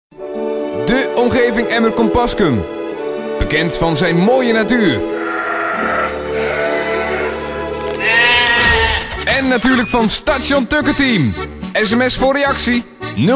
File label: Jingle; Audio Fragmenten